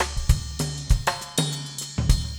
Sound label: cowgroove